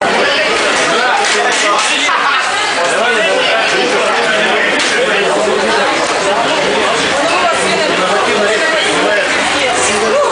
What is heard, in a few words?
ringtone people meeting